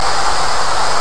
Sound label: noise